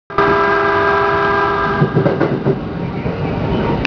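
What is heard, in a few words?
警笛 horn, JRE 209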